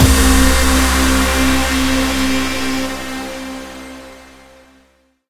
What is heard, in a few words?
stinger; Mirror's Edge (2008)